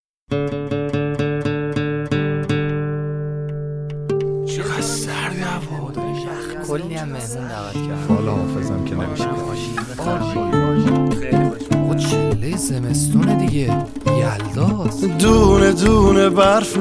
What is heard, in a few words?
music barfbazi shad